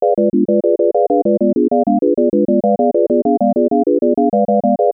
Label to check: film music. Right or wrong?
Right